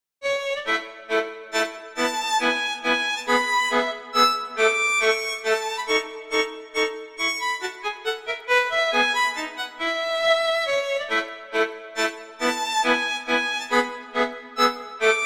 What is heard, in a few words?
Minuetto, Allegro; Mazas; Duets; Duet 10